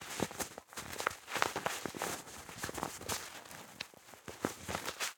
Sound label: 3M Mask Movement, Mask Foley; InMotionAudio